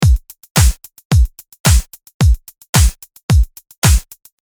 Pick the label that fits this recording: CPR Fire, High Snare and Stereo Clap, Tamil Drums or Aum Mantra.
High Snare and Stereo Clap